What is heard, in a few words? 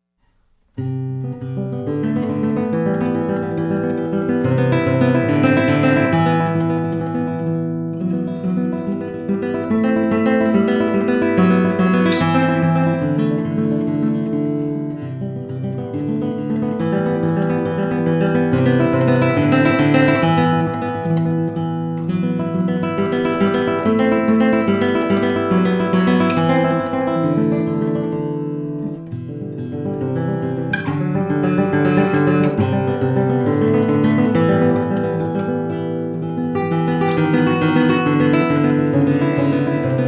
classical guitar